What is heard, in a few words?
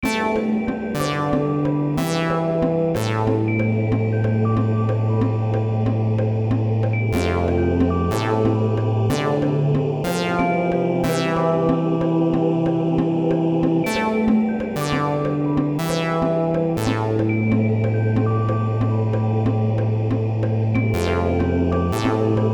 beat